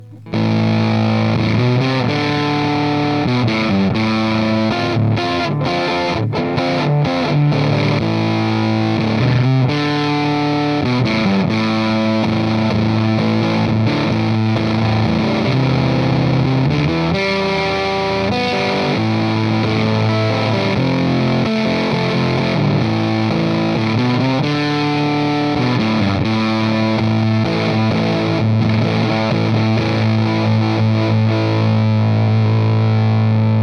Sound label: 6V6 EZ81 12AT7 G12 FUZZ; Axis Fuzz Gain quasi à fond